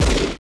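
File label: laser gun; Total Control - Action Cutz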